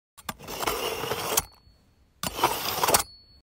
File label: Sharpening blade